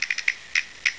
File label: CASTANET